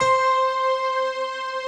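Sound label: bright electric piano strings; Optical Media International - Sonic Images Library; Slopiastring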